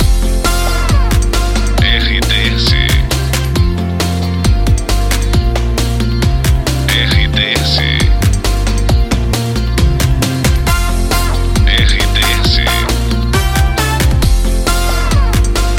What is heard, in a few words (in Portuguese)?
trilha para locução boa tarde; Pacote Itapoan FM, Salvador/BA, uma emissora com característica jovem